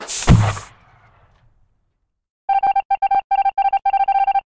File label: crew ejected; among us